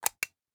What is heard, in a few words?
thompson safety off; Rust